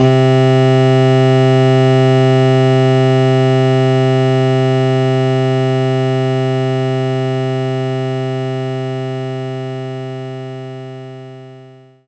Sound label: cello contrabass C3; knights try